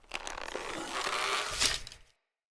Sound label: Hunter Bow Draw; Weapons; Enemy; Bows